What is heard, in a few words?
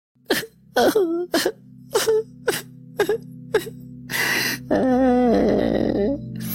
crying; salad fingers